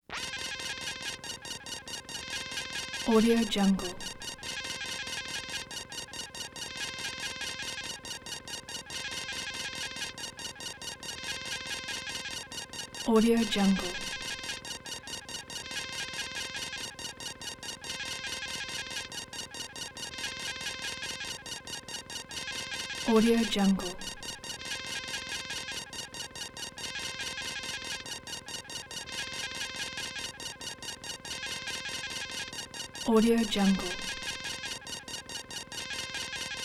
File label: beeps constant fast cluster bursts alarm; prosoundfx